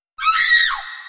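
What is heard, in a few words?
Horror Msg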